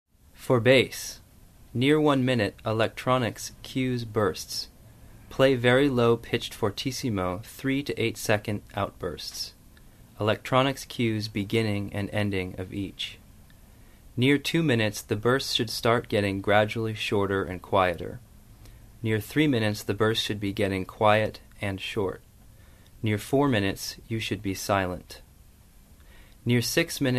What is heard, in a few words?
Bass; Score